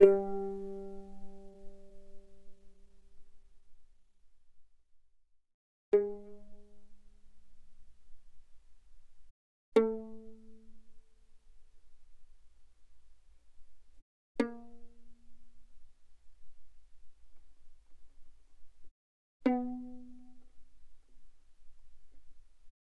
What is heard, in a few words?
Violin pizz mf sul G G3 B3; Strings; violin2012